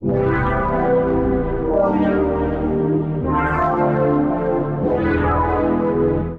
Bi-Phase Sector A Minor 75 bpm; MIDI Clips; Tonal; Accompaniment; Ableton; Factory Packs; Chop and Swing

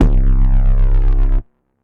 Bass, synth bass; Ableton; Factory Packs

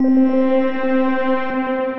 Vocal Pad; Synth Pad; Vocal Coalition Pad; Ableton; Factory Packs; Synth Essentials

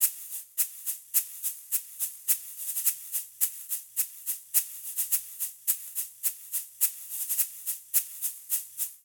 Bolero melodico Shaker-106bpm; Latin Percussion; Ableton; Factory Packs

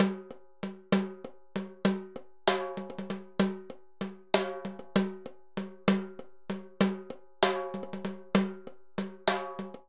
Danzon Timbales-130bpm; Latin Percussion; Ableton; Factory Packs

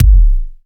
Kick, Foundation Drums; Basher; Ableton Foundation FreePacks

